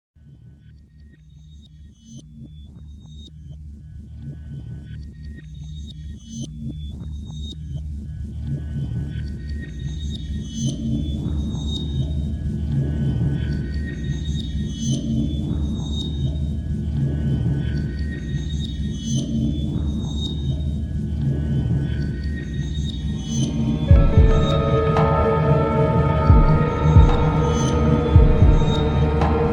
fundo musical suspense terror horror themes musicas de terror soundtrack

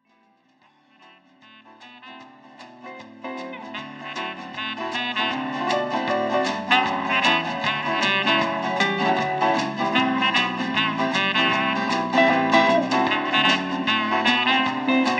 vinheta instrumental; musica que o mundo faz

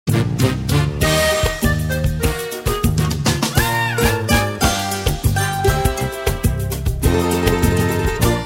toques para celular; a grande familia

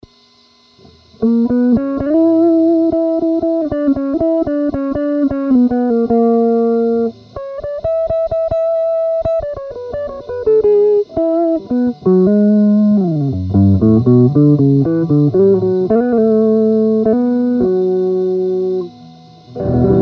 Solos de acustico

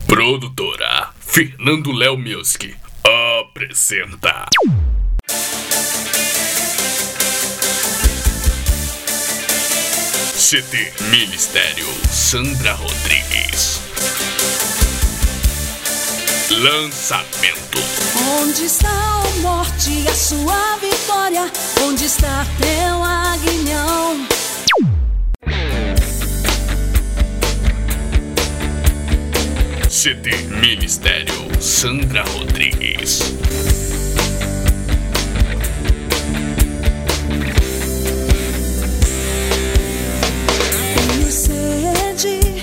vinheta

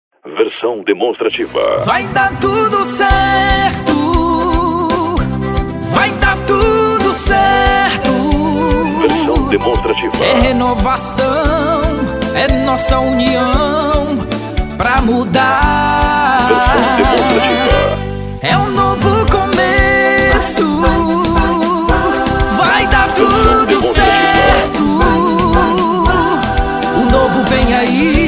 jingle pre campanha versao forro, jingle vereador prefeito eleição; pre campanha vai da tudo certo

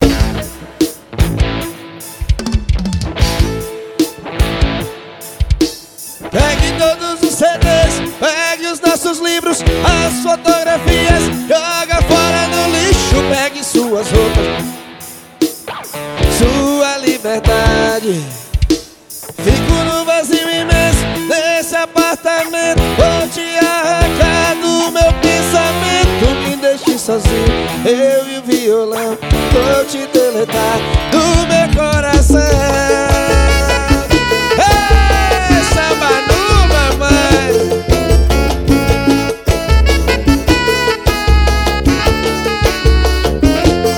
Samba

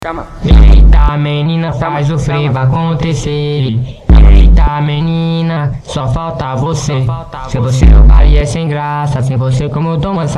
teste do grave

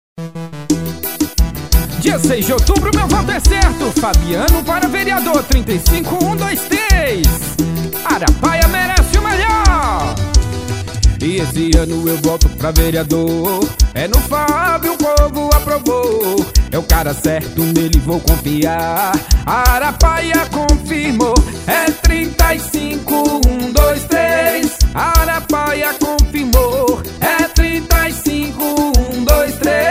Piseiro Jingle; Vereador Prefeito Eleição Campanha Politica 2024; Jingles Politicos

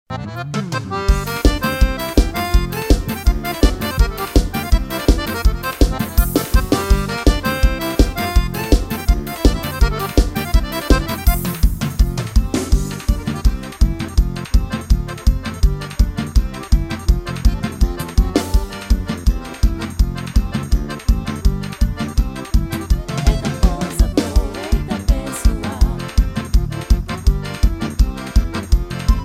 pouporri de forro

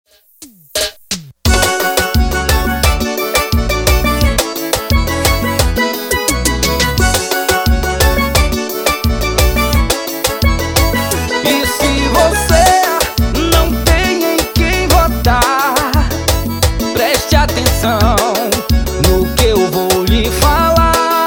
jingles politicos inedita piseiro

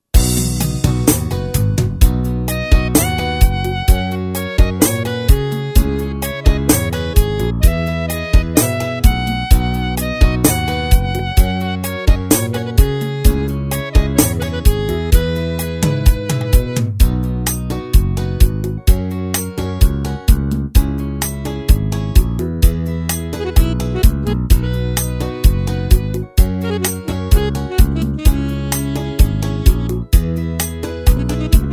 Bolero sertanejo, ritmos profissionais, Roland G70

